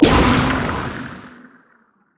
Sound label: explode; Vel'Koz